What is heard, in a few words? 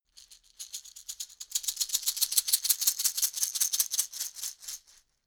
shaker wood rattle dynamic movement